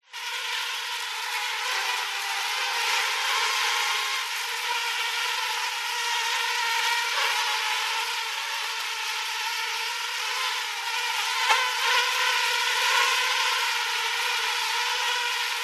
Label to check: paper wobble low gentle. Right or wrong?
Wrong